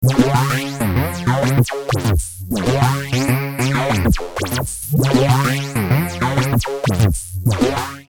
filterfahrt

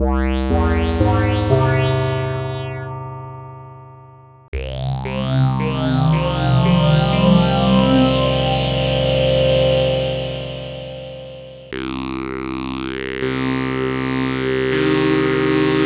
Diverse Filter

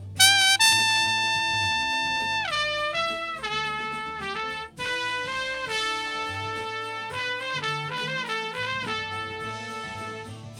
Doubler Trompete Engage

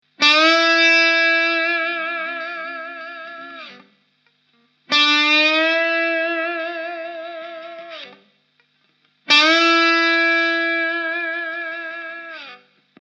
Bottleneck Anfahren der Note

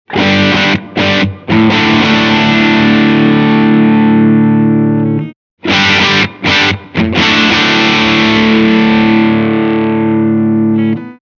Blackstar AMPED1 UK Endstufenvariationen

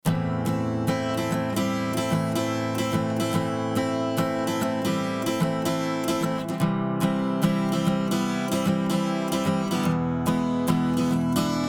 Gitarre Fast; Rockruepel Comp.Two V2

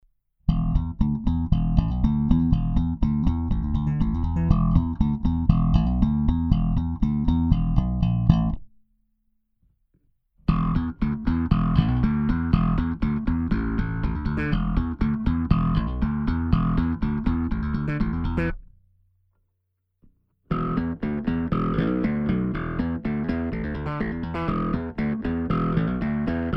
Slap Out Rechts DI Links; BB-1X Bogart

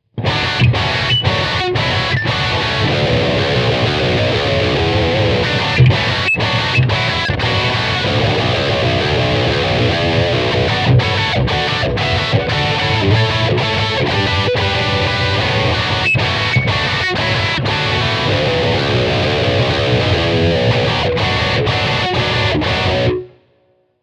Transparenz auch bei viel Verzerrung

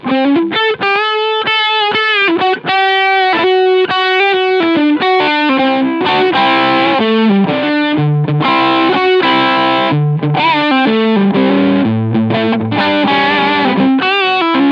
Mojo Mojo Full Gain EQ 12 Uhr RIFF; Paul Gilbert

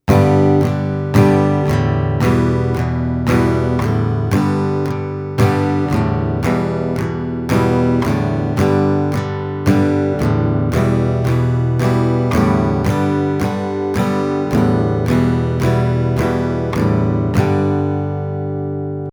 Akkorde wechseln Viertel fast

